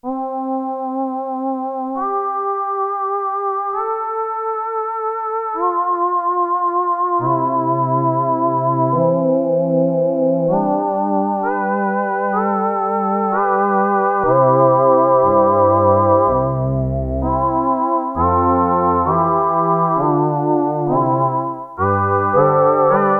VOICE, Korg DW-8000; Factory Patches

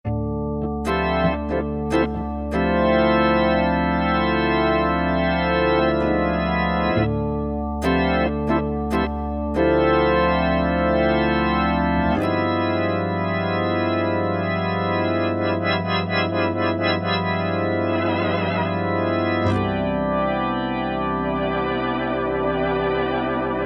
Korg MSC-9S Organ

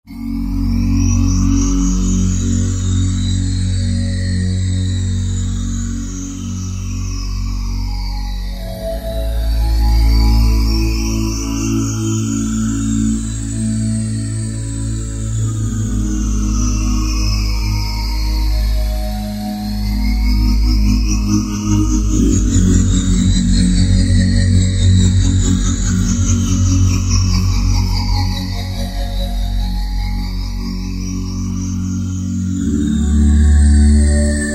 Phlangy Vox Pad, Roland JD-990; Factory Patches

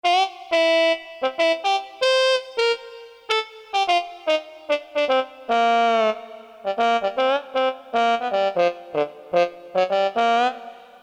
MUTED TB, Korg DSS-1

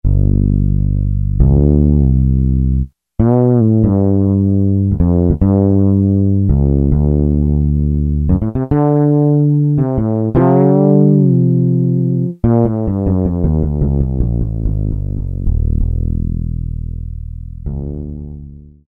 Fless Bass, Roland U-110; Factory Patch

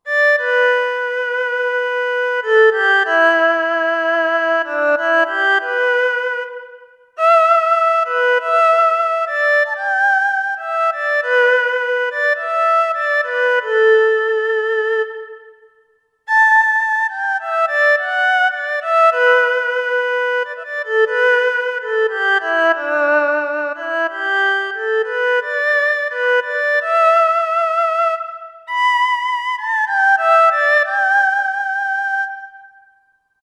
Er Hu Solo; Roland SR-JV80-14 World Collection Asia

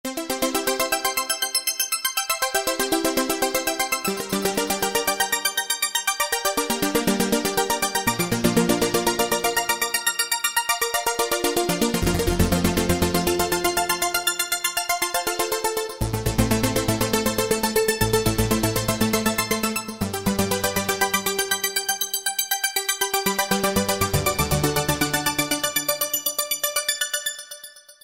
Sq-BigArpeggio, Yamaha EX5R; Factory presets

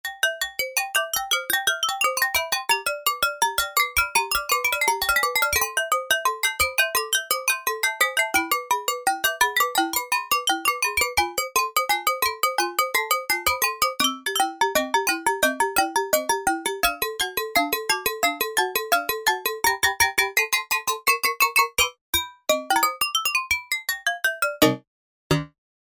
Music Box, mallets; Apple GarageBand 2

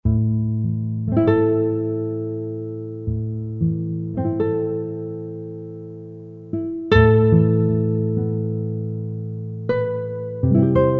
Solo Nylon; Roland SR-JV80-09 Session

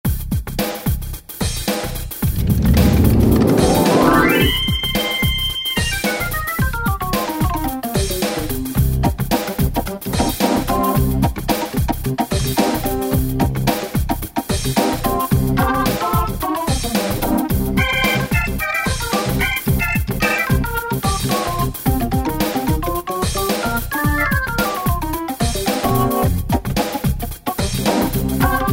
jam; E-mu PK-6

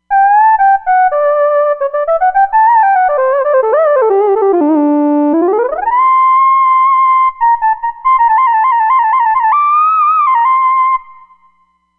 Soft Lead

